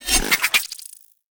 Spin Attack; Warframe; Hyena Micro; Attacks

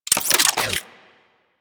Briefcase Akimbo Pistol Reload; Warframe; Weapons; Corpus

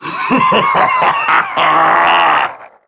Ghouls Death Laugh; Warframe; Galleon Of Ghouls

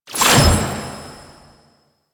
Rune Pickup; Warframe; Entrati; Mirror Defense

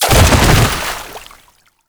Bodyfall Water; Enemies; Warframe; Proto Infested

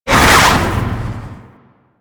Baby Dragon Split Shot Cast; Enemies; Warframe; Duviri; Void Dragon

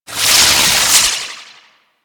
Beam Dissolve; Warframe; Captain Vor

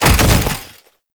Body Fall Light Default; Enemies; Warframe; Tau Dax

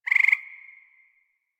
Forest Frog Close; Warframe; Grineer Forest

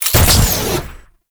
Mech Jump Boost Start; Nechro

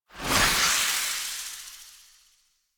Serpent Ability Swipe; Warframe; Warframes; Alchemist

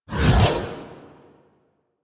Fire Deactivate; Phoenix; Warframe; Vehicles; RailJack; RailJackPowers

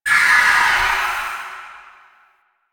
Blade And Whip Heavy Vocal; Weapons; Melee; Warframe; Dagath

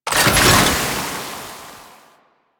Alchemy Grenade Deposit Toxin; Warframe; Entrati

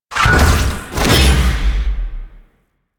Teleport Cast; Enemies; Warframe; Pax Duviricus

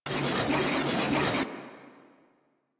Dagger Spinning Loop; Enemies; DE; Warframe; Warframes; Pax Duviricus

